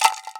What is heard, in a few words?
RATTLE, INDIAN PERC